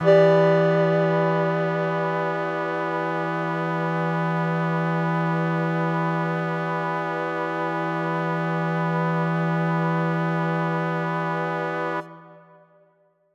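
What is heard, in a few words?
Mixed Winds E minor, synth orchestra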